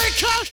LIVE CLAP; Univers Sons

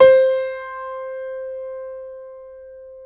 PNO Grand Pno; Club-50 - Foundations Roland

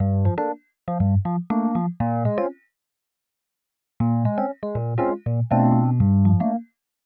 120 Rhodes Riff Chords G; PowerFX

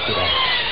tie fighter; Amiga Soundtracker sample

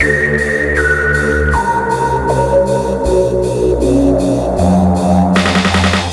Disconnected 157 Dmin, Hardcore Breakbeat; Big Fish Audio - Hardcore Breakbeat Action

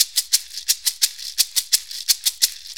Pandeiro, Perc Loops; Drum Loops; 4-4; Sonic Foundry; Drum Tools

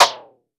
Tempest Analog Snare

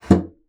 bassoon slap; Xsample VOL-6; C BASSOON

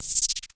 LFO NOIZ, Klone Dual-Percussion-Synthesiser; KLONE FX; Drum Machines

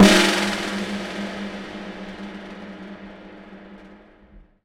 Snare Hit, Impact Drum Hits; Sonic Foundry (Sony Creative Software); The Electronic Music Manuscript Collection

